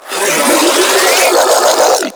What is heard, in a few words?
Proto Infested Ancient Mid Spin Scream; Warframe; Abilities